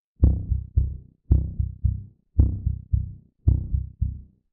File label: Heart Beat Loop; inside